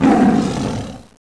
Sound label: lion attack; Caesar III